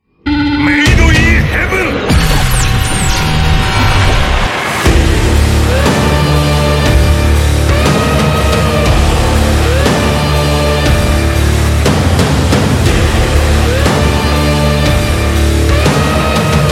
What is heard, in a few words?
bassboosted